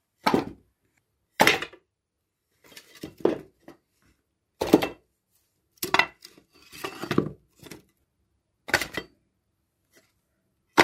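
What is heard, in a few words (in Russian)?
топор кладут на дрова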